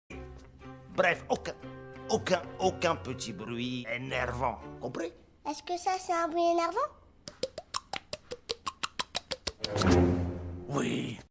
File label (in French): bruit enervant